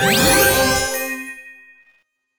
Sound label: ring; U RING